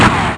Turret; PC Gamer IT CD 48; Big bang

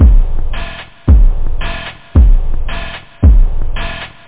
drum; da capo vol1; instruments

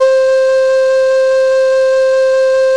shakuhachi; yamahaxg; gmtones; pipe

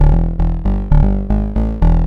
baadum, Bass

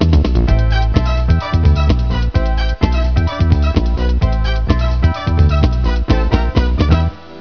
Groove

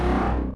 ring in; Sphere

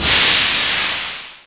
steam; DevilDarts 2.0 FAT

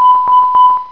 Error; Movie Cleaner Lite 1.2

